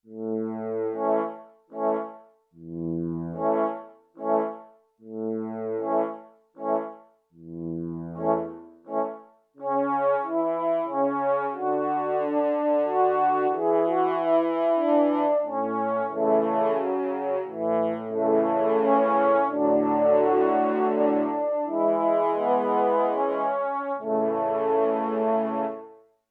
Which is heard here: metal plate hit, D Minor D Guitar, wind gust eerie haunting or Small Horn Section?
Small Horn Section